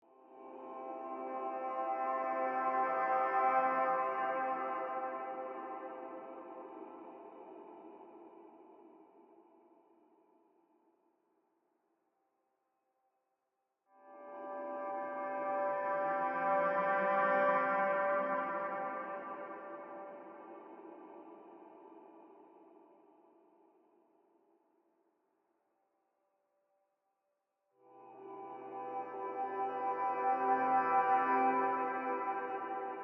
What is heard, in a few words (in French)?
Atmospherique